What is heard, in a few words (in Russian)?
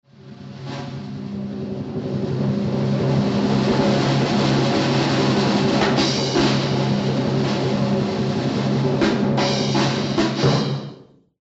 barabann drob